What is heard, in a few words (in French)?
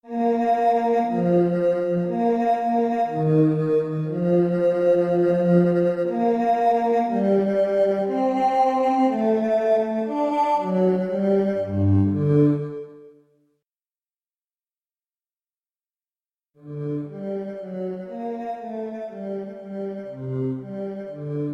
basse